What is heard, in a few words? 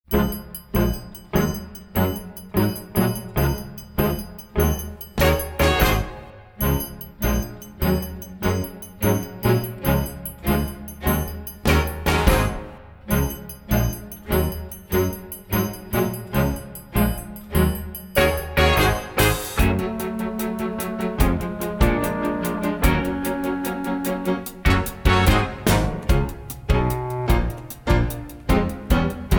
instrumental; Something Rotten Jr